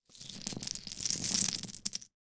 game plastic houses and hotels pour out of cloth pouch on carpet floor; monopoly